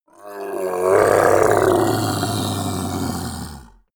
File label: monster dark growl bubbling; kreaturen